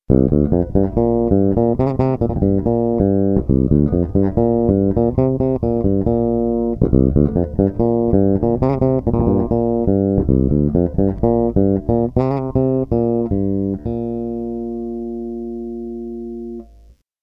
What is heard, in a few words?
Bridge, bassblaster; szeregowo